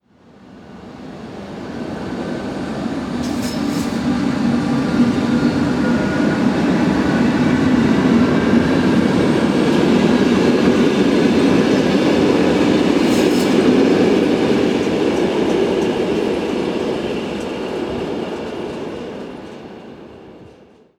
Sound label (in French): trains diesel et electriques; sonotheque